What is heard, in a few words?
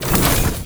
mecha hit